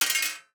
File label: DICE on Metal, Throw and Roll, Mini, One Die; Samples; ArticulatedSounds; DICE Sfx Party (Extended)